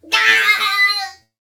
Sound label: death